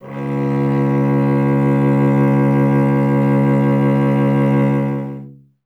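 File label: strings cello C#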